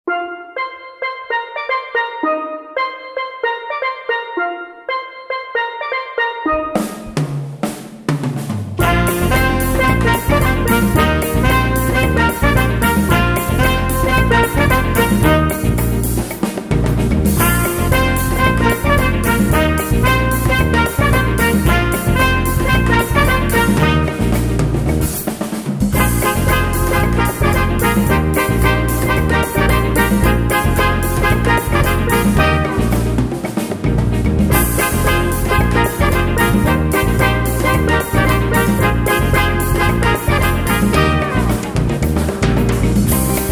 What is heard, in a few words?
band broadway mambo medley